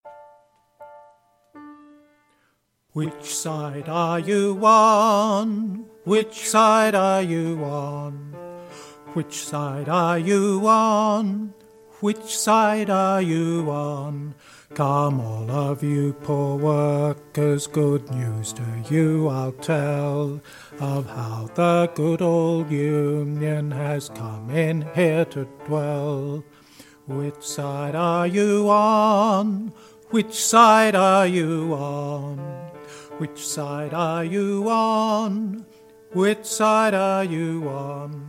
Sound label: Bass